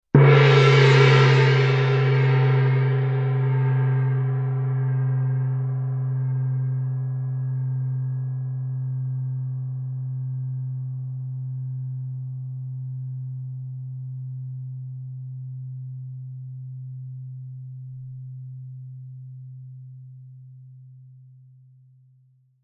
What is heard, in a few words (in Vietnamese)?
danh cong chieng vang vong